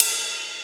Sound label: crash sound e key, tuned drums e key